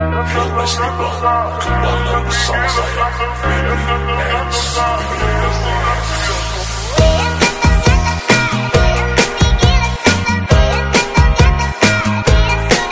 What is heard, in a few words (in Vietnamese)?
Nhac chuong remix; TikTok